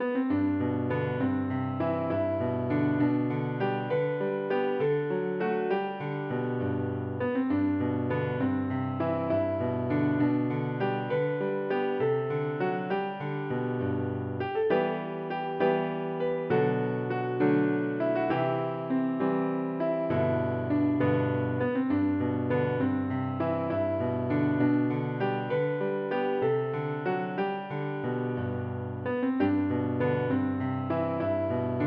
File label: piano